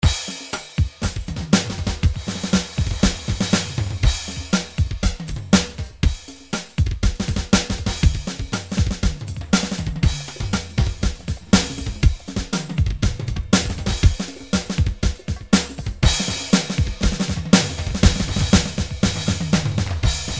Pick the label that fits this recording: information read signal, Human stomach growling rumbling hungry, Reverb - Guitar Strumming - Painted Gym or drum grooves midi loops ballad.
drum grooves midi loops ballad